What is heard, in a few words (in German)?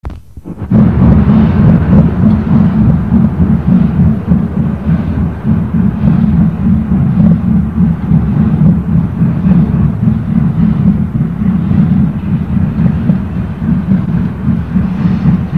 Vorbeifahrt; alsdorf